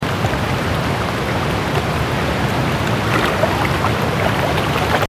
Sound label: damage vehicle; World Of Warships